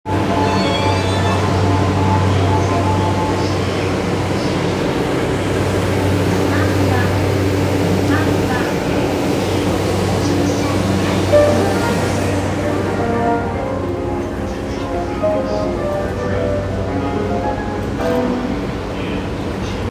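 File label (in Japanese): JR山手線五反田 counter-clockwise, train sounds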